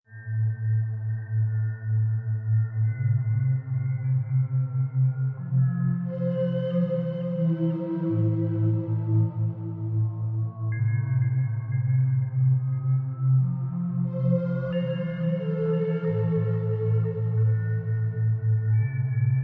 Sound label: ambi space, Ambience